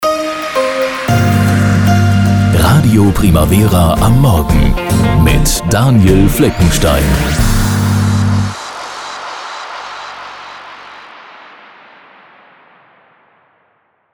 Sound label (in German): Show Opener Morgen; RADIO PRIMAVERA